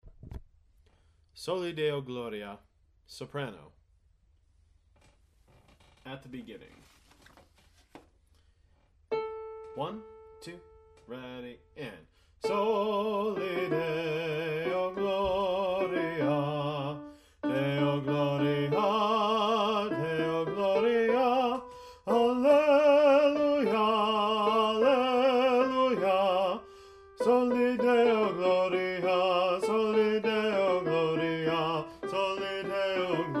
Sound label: Soprano, choir